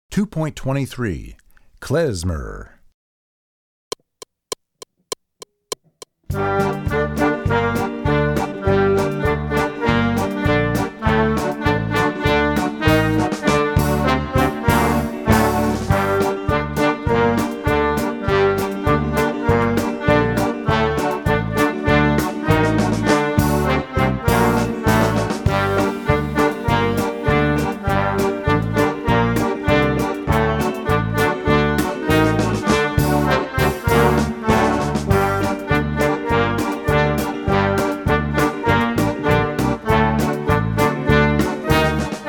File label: Klezmer